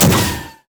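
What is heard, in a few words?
spell flux; LoL; ryze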